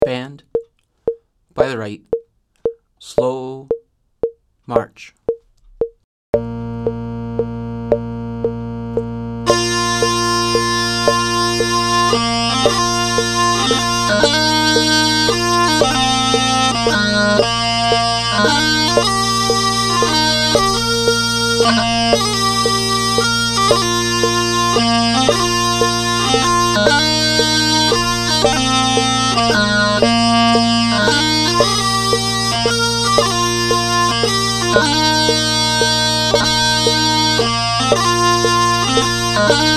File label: 38 bpm pipe section; The parades run each and every Saturday throughout the summer, rain or shine